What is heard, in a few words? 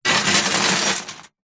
keys large bunch prison guard set down on metal surface hollow